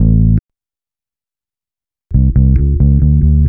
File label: BASS, Roots Funk; USB Soundscan vol.41; AKAI